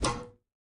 Boot Up

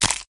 Hit Enemy Body

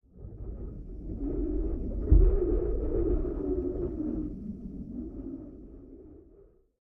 Wind Outside Ship; Lethal Company

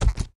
Step

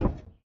Aluminum; Lethal Company

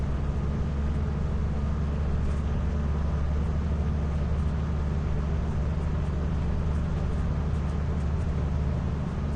Ship Engine Outside

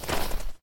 snow

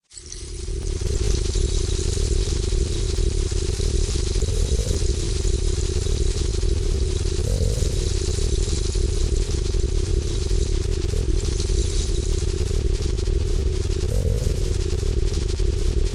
Angered

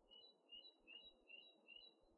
Bird; Lethal Company

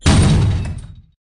Door Slam

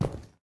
tile; Lethal Company

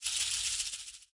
rattle tail; Lethal Company

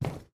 tile

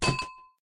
Drop Bell

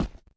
Gravel; Lethal Company

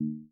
Move Ship Object Deny

